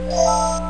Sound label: REWARD